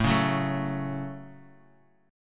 A 6th, guitar; x,x,7,6,6,4,x,x

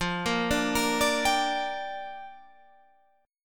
G Minor 7th, F

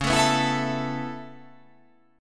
C sharp Minor 7th Sharp 5th

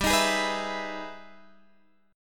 E Major 7th G, chord; strummed; Emaj7/G; JGuitar

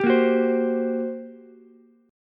G Minor Major 11th G, Ukulele, C6; strummed; 12,10,14,15

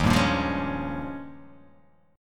D sharp 11th D sharp

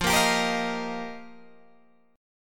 F 7th Suspended 2nd Suspended 4th, Guitar Open G

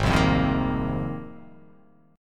G sharp 6th Add 9th G sharp

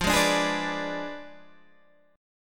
F Diminished 7th F down Guitar Open G

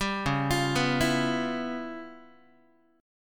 C sharp 7th Sharp 9th Flat 5th C sharp Guitar; arpeggiated; 4A,4E,5A,5D,5G#,6C#; C#7#9b5; 10,9,8,9,8,x; JGuitar

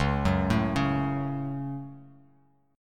Db Suspended 4th Sharp 5th; arpeggiated; JGuitar

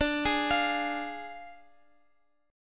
D Diminished D, Guitar, Open F; Ddim arpeggiated; x,x,x,9,11,12

